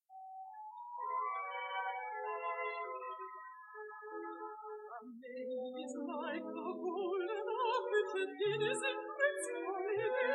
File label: air